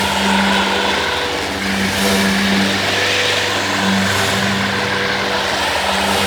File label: Super Weapon Energy Loop; Warframe; RailJack; Proc Ships; Corpus